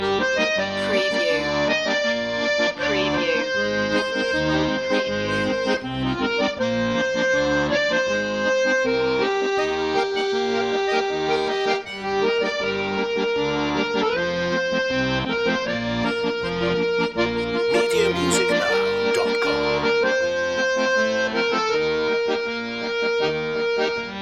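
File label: cheerful accordion loop; World Influences / Eastern European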